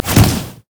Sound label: wildfire; LoL; brand zombie